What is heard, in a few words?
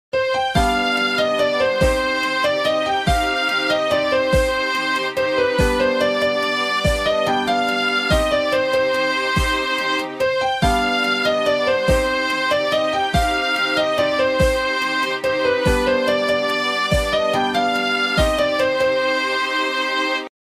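background music ringtone new tamil ringtone song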